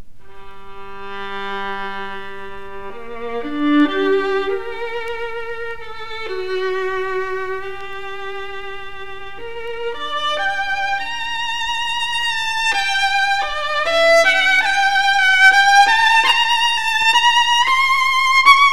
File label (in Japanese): 擦弦; G da Salo; 弦鳴楽器